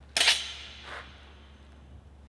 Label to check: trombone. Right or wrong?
Wrong